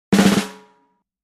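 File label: Drums Snare Short Crescendo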